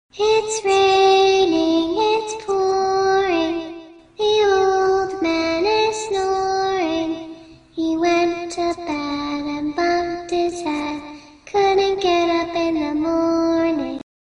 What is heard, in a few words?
Creepy Little Girl Singing